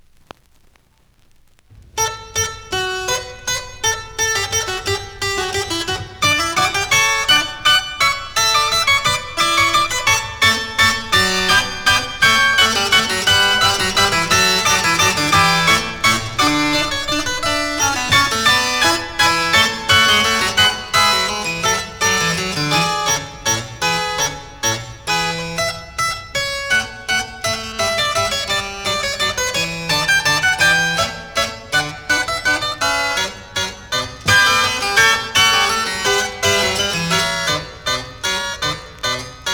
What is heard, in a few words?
Fugue in D major, Suites for Harpsichord; Musopen; Handel George Frideric